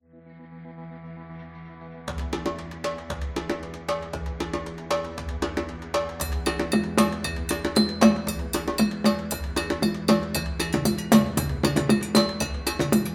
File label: African Drums Tribal; tribe